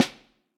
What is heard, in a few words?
Vintage Snare Drum; Q Up Arts; Johnny.C's Vintage Snare Drum; 4X14 JCBOB